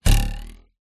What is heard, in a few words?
cartoon bounce jump ruler twang